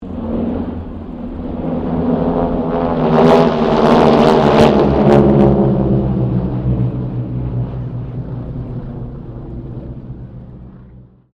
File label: AIRCRAFT HARVARD SQUADRON 3 PASS DISTORTION; Southern Knights Squadron; Airplanes